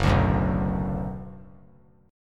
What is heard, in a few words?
F sharp Suspended 4th Sharp 5th chord; strummed; F#sus4#5